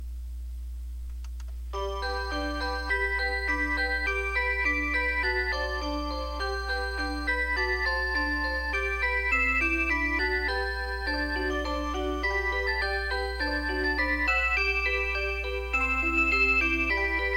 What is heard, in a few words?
Choo-Choo Train; Kisya Poppo